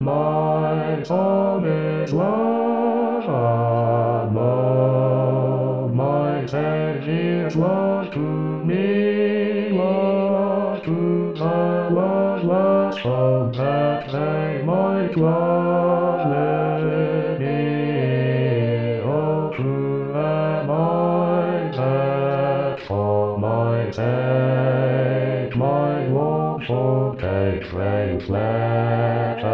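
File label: My song Bass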